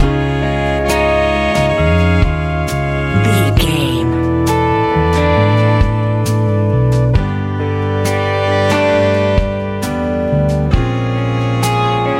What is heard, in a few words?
colorful hot lucky rabbits foot life rnb soul